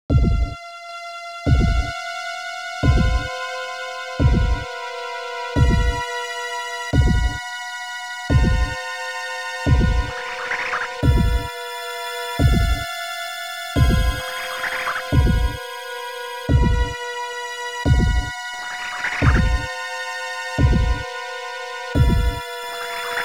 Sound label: Environmental Sound (Pulse); Super Metroid X-Fusion; SNES